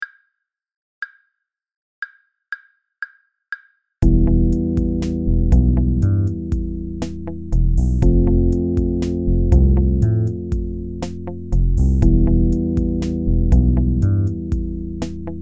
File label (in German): Phrygisch E Moll Play Along